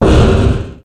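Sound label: Collision Exterior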